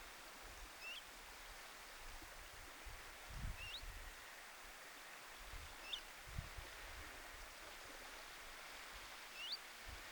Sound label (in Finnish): lintu aantelee